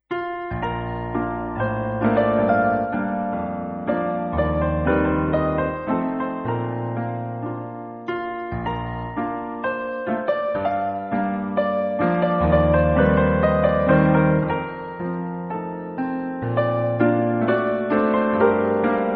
plie

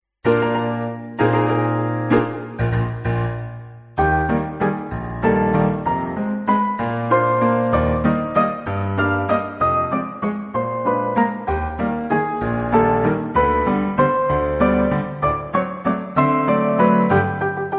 gr valse

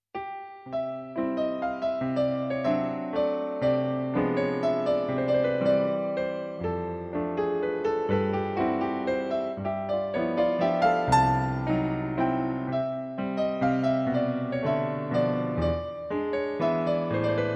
Mazurka

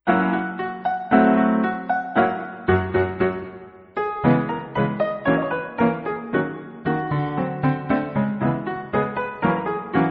jete

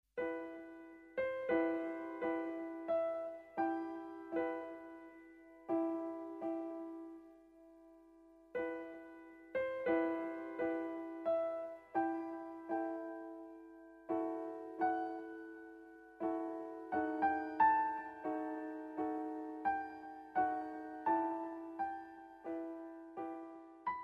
Siciliano

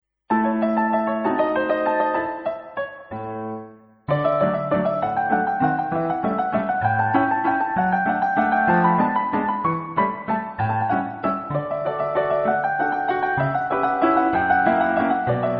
valse pir

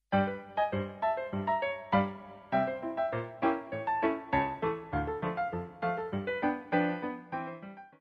Ragtime

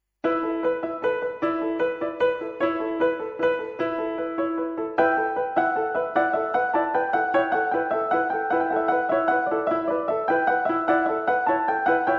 triplets

